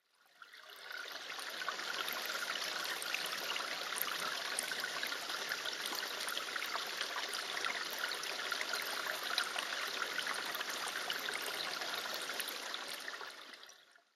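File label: water sound; mizuoto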